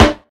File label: natural acoustic snare sample d sharp key; snare drum samples